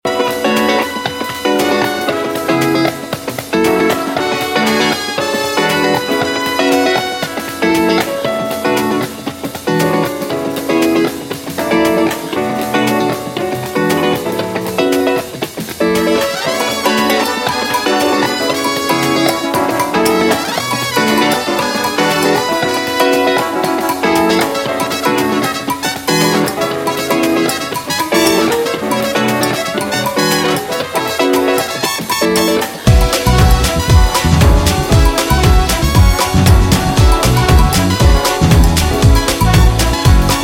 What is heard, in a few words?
Swing Cuban Jazz